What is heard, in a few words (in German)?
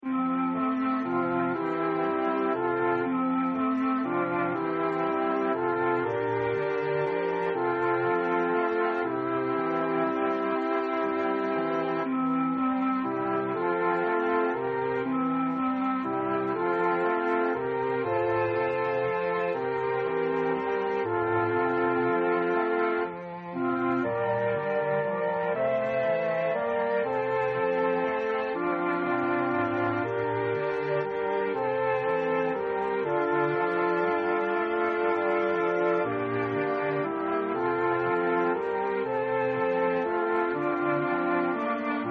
Happy Birthday Walzer